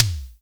Floor Sn, ANALOG; Roland MV8800; ANALOG CRAZE

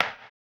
HAMBONE, Vocal Blues Soul

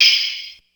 Tamb, Ensoniq ASR-X Pro

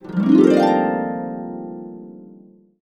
UP140, Complete Harps; USB Soundscan vol.62; AKAI

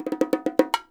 LOOPS, NYC Percussionworks; Sampleheads; 90 s Sample CDs

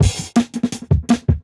Echo; 90s Sample CDs; Original Jungle Breaks

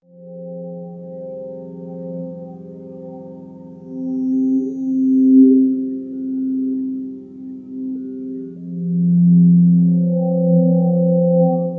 Melodic Bed; Sonic Foundry (Sony Creative Software); Slow Silhouette

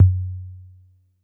G2 Analog Tom 2, Yamaha PSR-E323

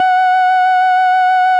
FARFISA, F#2, Vintage Keyboards

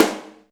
Snare, Alesis DM5

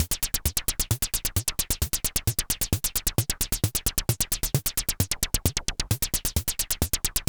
JP8; AMG; Now CD-ROM (Roland); Trance; G.T.M. SEQ 2

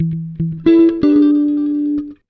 Blues, Electric & Acoustic Guitar Loops; USB Soundscan vol.04; AKAI

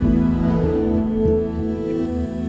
Chant Down Shift; Sonic Foundry (Sony Creative Software) - Friendly Fire; DroneLoop

